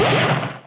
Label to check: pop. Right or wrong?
Right